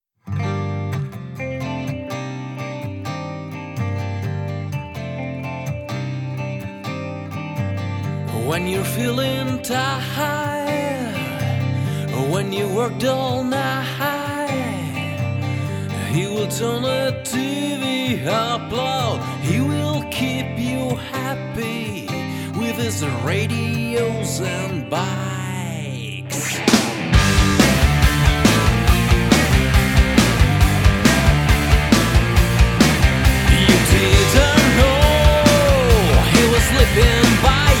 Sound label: SONG